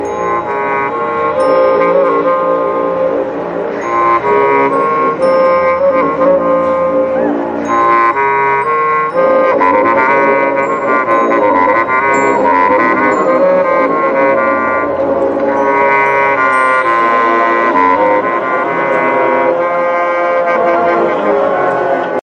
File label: Solo